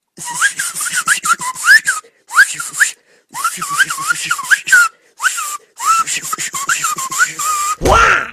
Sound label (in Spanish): Chifla; Naco